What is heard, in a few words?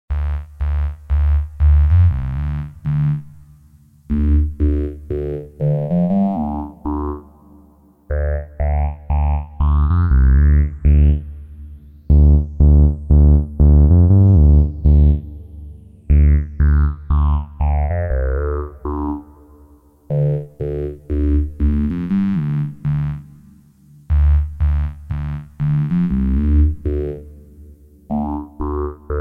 MOS Phaser